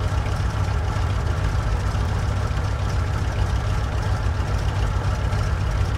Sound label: zero cockpit, engines; War Thunder